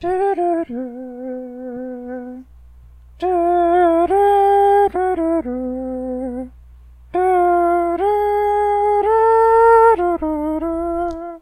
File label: beatbox; altverse naruto modpack